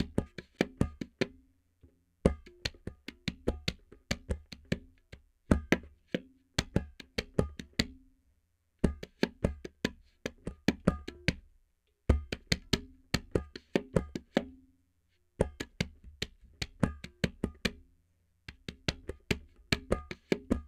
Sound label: tabla A 073, Hip Hop India, 073bpm; Hip Hop India Modern Percussion; Apple Loops